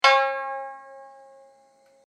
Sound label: sanshin